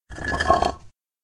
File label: Deep Rumble Walls